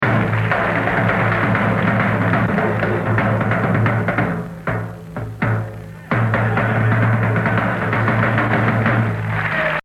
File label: Solo; The Evolution of Jazz Drumming (Gottlieb)